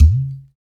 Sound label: UDU, African